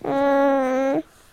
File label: grunts, baby